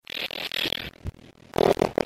fart

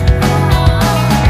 ahaha

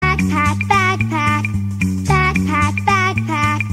backpack song

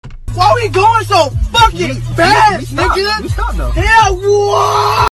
fast car

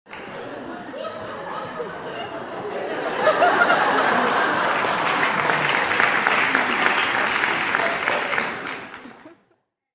audience crowd laughing and clapping sound effect

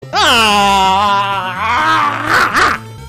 rage

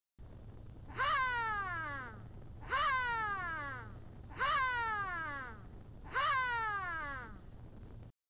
rawwr

